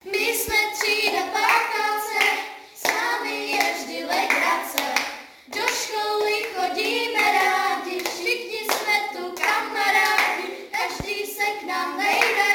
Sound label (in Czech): zpiva